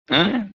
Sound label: huh